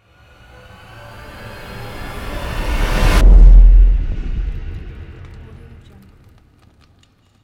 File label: cinematic fire logo